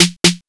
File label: snare